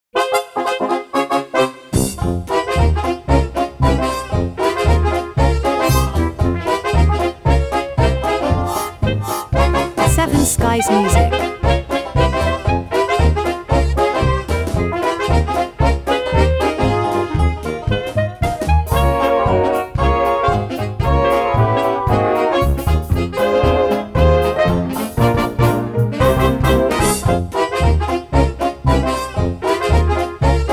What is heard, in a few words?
Dixieland